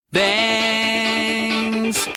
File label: bangs